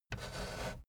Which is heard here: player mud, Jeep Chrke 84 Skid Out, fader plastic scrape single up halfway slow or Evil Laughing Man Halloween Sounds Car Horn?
fader plastic scrape single up halfway slow